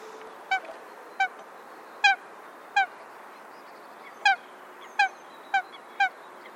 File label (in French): foulque macroule